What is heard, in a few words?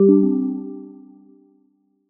shutdown; sonic branding ux; insiteful